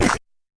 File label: fx drum, Electro FX; UNUSUAL KITS and FX